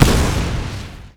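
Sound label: double jump explosion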